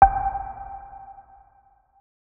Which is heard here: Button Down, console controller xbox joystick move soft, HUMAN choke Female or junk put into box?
Button Down